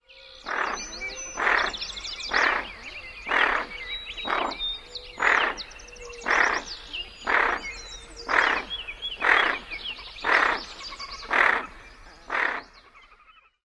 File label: female growls